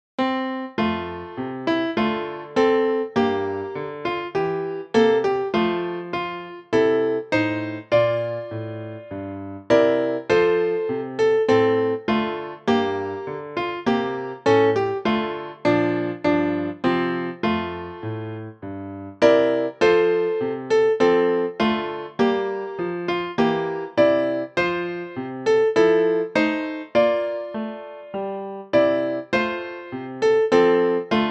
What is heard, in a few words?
auld lang syne piano